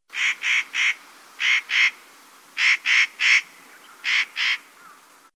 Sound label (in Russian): kedrovka golos